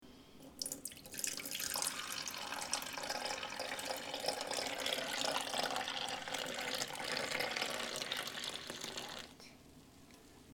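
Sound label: pouring coffee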